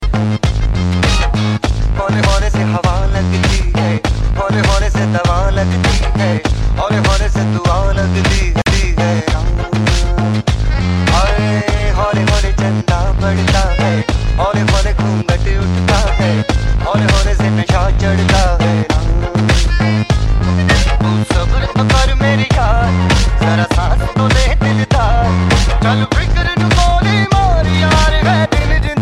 House Music

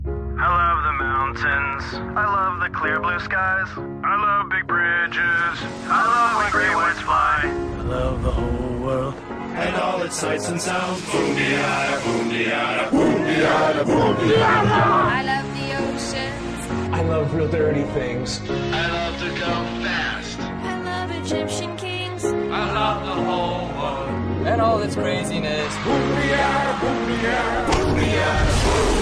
Alarm

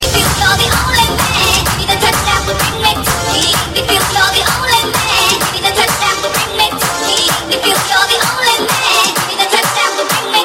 touch house

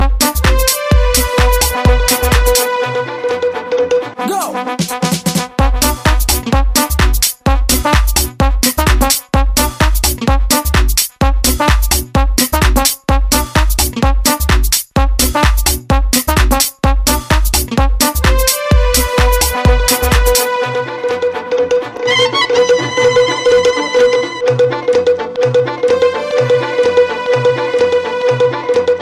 minimal love

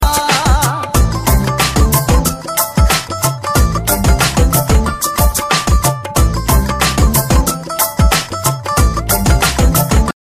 Punjabi soul

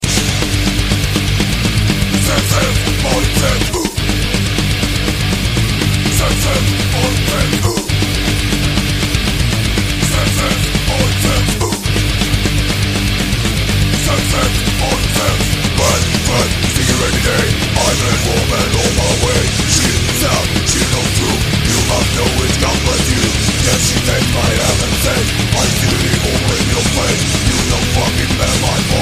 metal dead

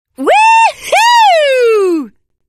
Notify

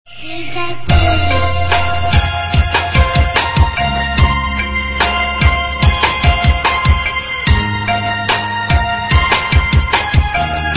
Hmong music

